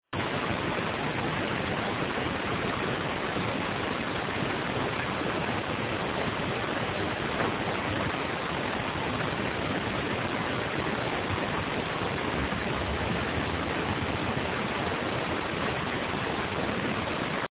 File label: walking furniture room; paranormal; elsings 2nd hand shop